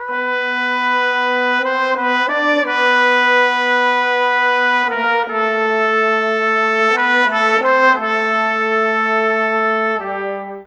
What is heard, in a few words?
Brass, Cinematic